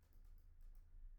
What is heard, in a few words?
mouse; IEEE AASP